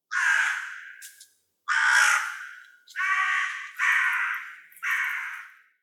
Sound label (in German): Rabenkraehe Corvus corone